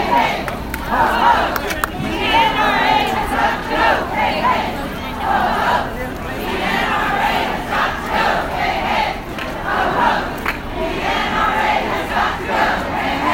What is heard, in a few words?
NRA Has Got To Go